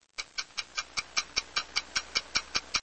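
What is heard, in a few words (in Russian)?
тиканье старых часов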